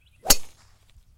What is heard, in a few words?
Golf Shot Driver